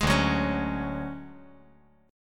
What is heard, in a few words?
D Major Sharp 11th D, chord